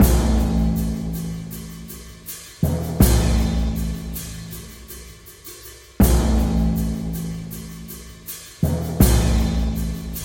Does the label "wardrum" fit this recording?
Yes